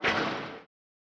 Eerie Shatter Hit; SoundsCrate

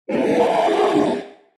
raptor roar big; soundscrate

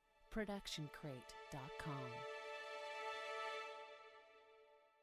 feel good strings swell

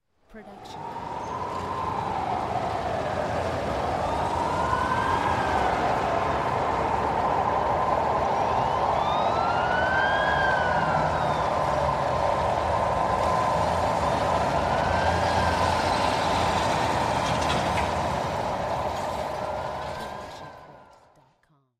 ambulance siren; SoundsCrate

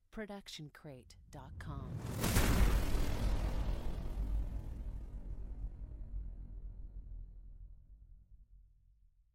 Sci-Fi Charge Up and Blast; SoundsCrate

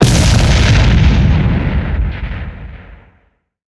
explosion boom

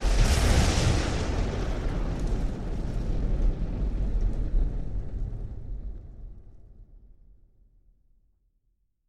thunder punchy; soundscrate

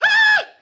man scream fear; soundscrate

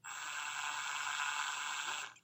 drill slow medium

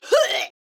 woman death grunt; soundscrate

